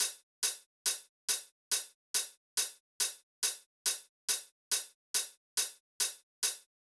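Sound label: drum Loop 140BPM overhead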